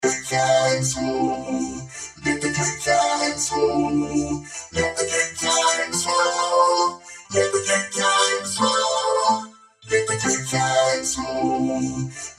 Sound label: vocoder example